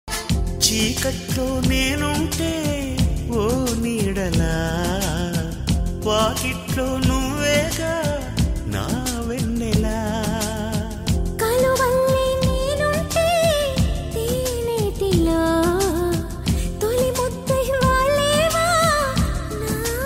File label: telugu song; rajakumarudu movie